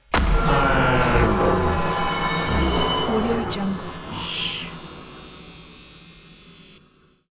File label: time exit vocal morph